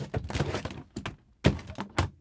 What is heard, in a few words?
SUITCASE, Tools; AKAI S6000 CD-ROM - Volume 6